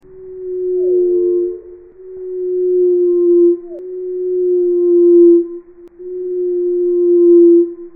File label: Nightingale Song Sad cry embarassed; Suonho; Reinsamba